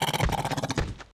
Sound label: PEACE WALK, Mob; FLEMGUARD